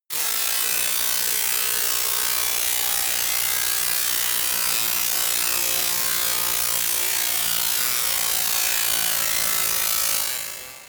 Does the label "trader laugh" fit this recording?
No